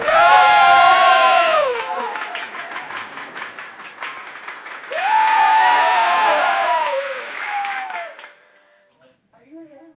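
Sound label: trio elétrico full of peop, carnival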